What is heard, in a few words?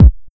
KICK 909